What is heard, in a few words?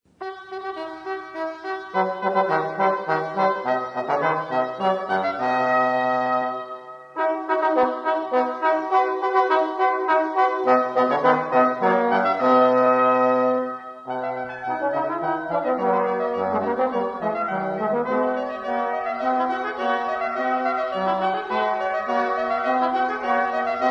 fanfare; purcell